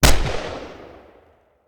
fire, mac10; CopsNRobbers

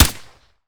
fire sup, glock; weapons; Urban-Coalition; Decay; arccw ud

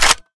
usas ch back, weapons; Urban-Coalition; Decay; arccw ud

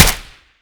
fire 762 sup, mk23; ARC9 uplp fesiug

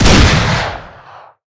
fire; arccw ud

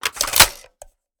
mag in, g36; ARC9 uplp fesiug

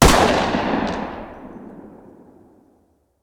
fire 45 dist, uzi; weapons; Urban-Coalition; Decay; arccw ud

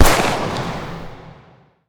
fire dist 357, glock; weapons; Urban-Coalition; Decay; arccw ud

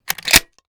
shell insert, weapons m1014; arccw ud

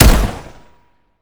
fire, weapons 870; Urban-Coalition; Decay; arccw ud

